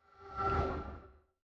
soft metallic whoosh brig; rise

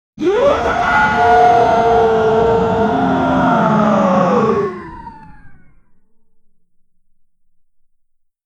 sound effect, triumph

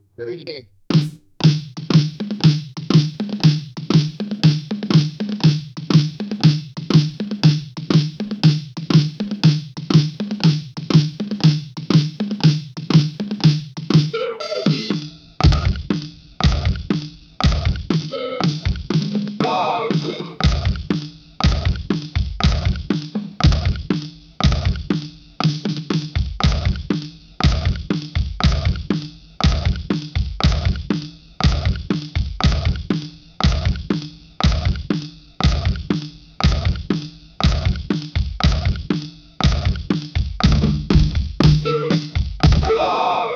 comic drum solo very fast; tom

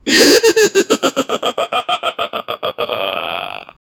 evil laugh; villain

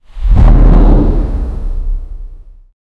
the wooden door bursts; portal

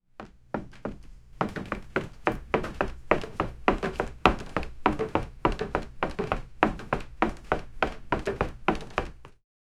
bare feet running on wooden, wooden floor

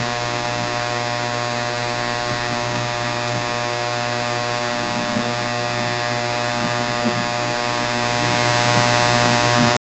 the buzz of a nuclear

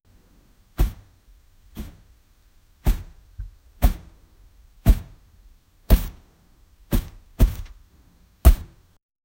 elephant

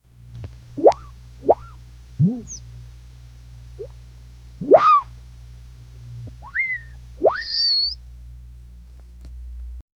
soft whimpers gasp very sh

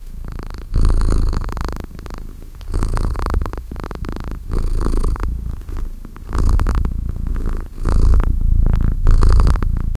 happy kitty purr; purring

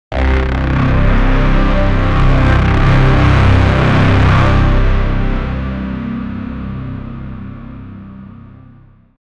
intense electronic charac, sting

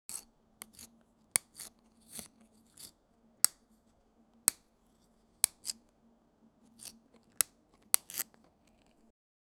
cutting slowly strawberry, chopping

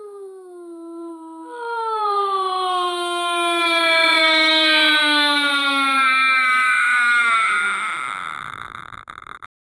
tormented soul dying

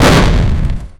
tank

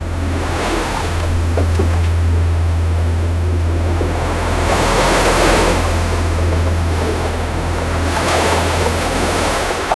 ship saling in sea

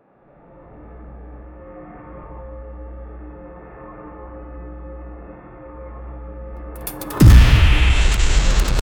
war background sound

